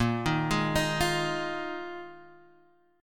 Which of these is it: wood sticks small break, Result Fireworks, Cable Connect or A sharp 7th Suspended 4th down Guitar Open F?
A sharp 7th Suspended 4th down Guitar Open F